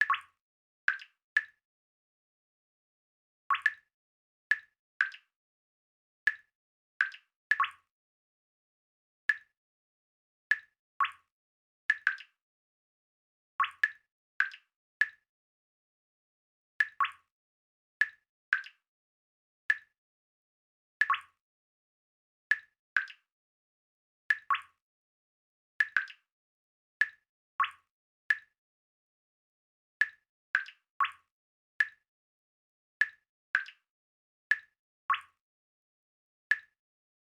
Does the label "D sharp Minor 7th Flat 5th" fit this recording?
No